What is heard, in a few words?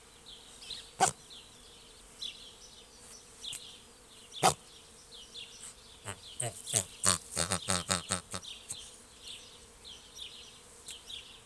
Great bustard